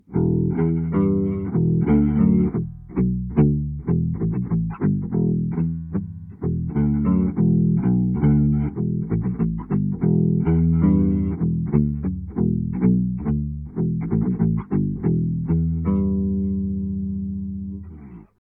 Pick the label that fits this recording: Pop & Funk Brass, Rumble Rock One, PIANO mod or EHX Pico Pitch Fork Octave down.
EHX Pico Pitch Fork Octave down